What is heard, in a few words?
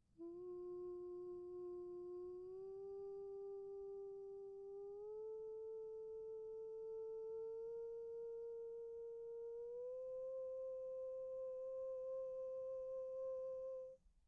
humming